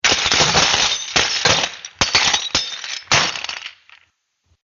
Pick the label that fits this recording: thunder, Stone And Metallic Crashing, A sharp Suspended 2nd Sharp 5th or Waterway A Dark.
Stone And Metallic Crashing